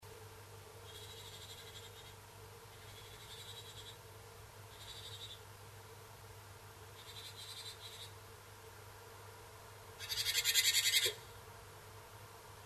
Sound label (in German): meise schimpfen; nistkasten